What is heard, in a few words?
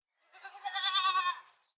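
sound of bleating in the distance; zvuk bleyaniya vdaleke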